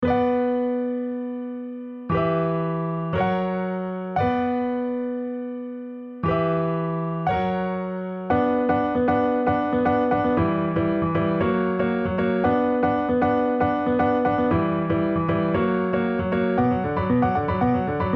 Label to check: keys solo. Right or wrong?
Right